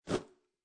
Tissue Grab From Box Single, Foley, Tissue Grabbed From Tissue Box, Single Tissue Grabbed